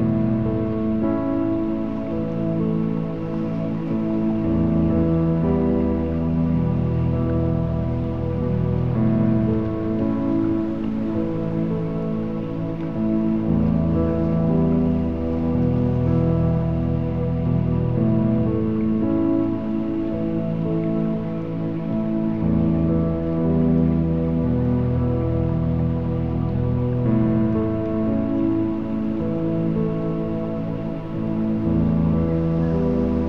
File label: Baby Lullaby; Baby Oasis S-580